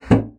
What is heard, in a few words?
bassoon slap; Xsample VOL-6; C BASSOON